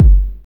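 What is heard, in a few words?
bass drum; E-Lab; X Static Goldmine 1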